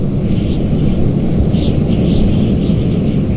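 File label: blowing nose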